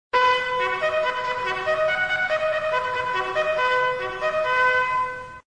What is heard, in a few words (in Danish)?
signal fremad